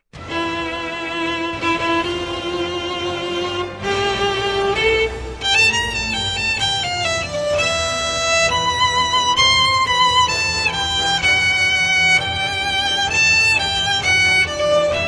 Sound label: The Violin; Shadow of the Colossus